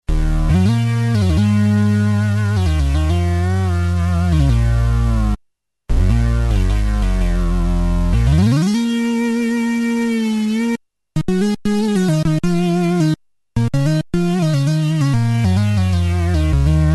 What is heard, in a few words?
mono bass